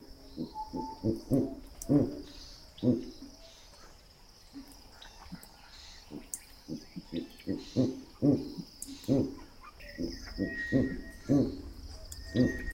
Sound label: Owl Verreauxs Eagle